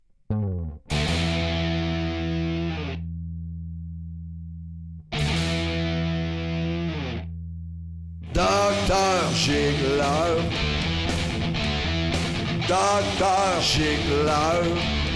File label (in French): musique; Dr Gicleur; CHANSON THEME; Docteur Gicleur